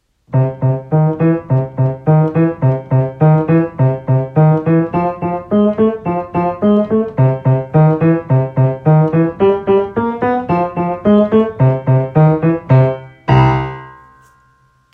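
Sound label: Low Down Boogie, Piano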